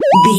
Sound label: retro alarm siren loop